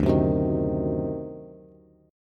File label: D 7th Flat 9th D down Bass; D7b9 strummed; JGuitar